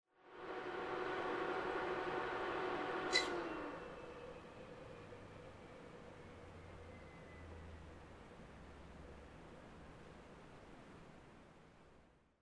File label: PC Operating Noise Far Forced Shutdown